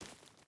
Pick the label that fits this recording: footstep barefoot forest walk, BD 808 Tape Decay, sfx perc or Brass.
footstep barefoot forest walk